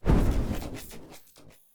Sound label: spell shock take; player